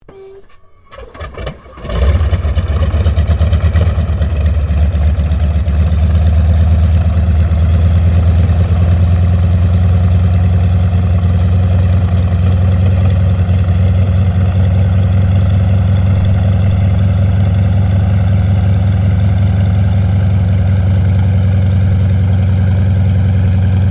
Aircraft Yakovlev Yak-52 Startup Taxi, Russian Yak52 Training aircraft